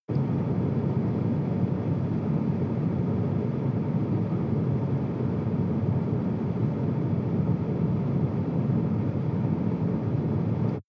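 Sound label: sports car interior ventilation step 9-15; verkehr transport; autos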